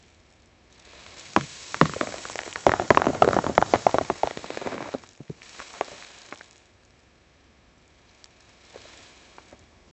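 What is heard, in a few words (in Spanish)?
caída de piedras en la, rocks falling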